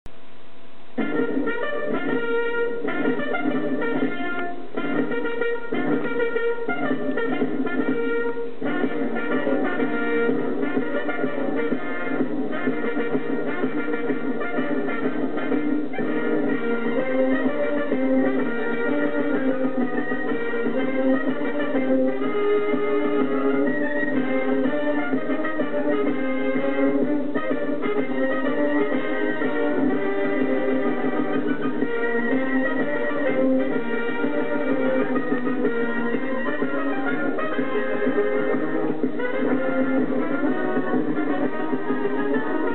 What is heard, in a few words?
Military March; 1943